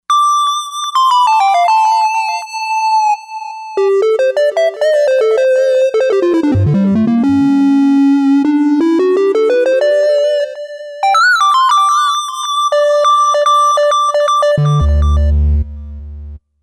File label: Lead Tri Lead, synth